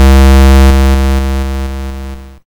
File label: YM Bass C; ChipShop Deluxe; ChipShop Hits